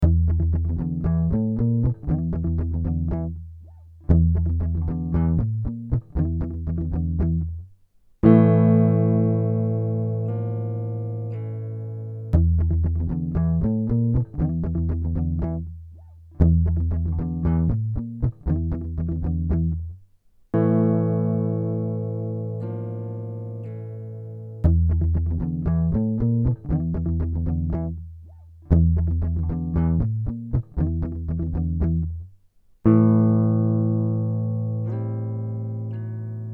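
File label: Em 117 fuzz guitar riff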